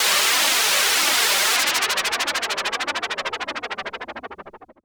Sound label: Juno-60, Filtered Noise